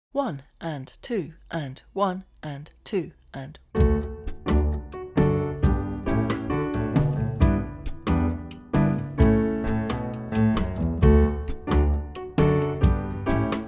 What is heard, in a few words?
Linstead Market backing